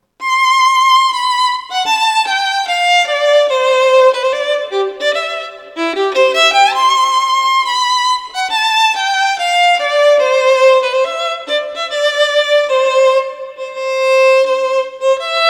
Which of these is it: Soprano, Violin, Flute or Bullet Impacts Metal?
Violin